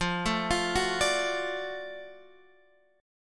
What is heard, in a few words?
F sharp Minor Major 7th Sharp 5th